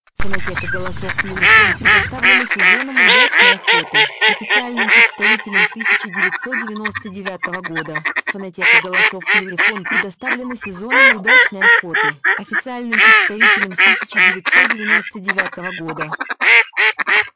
кряква; plurifon

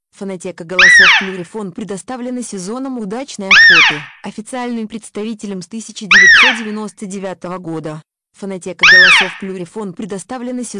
косуля самка; plurifon

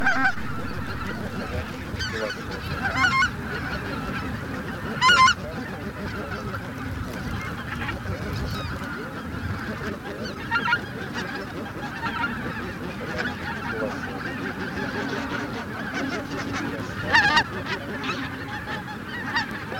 Белолобый базар Oche Lombardelle

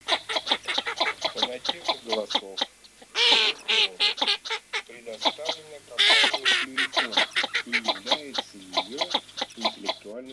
Широконоска Mestolone

